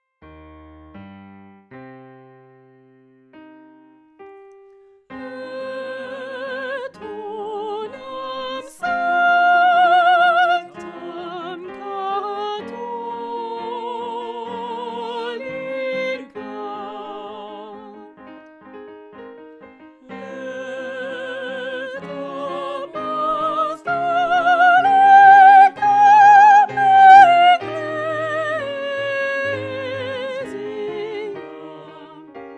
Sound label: soprano Credo Larghetto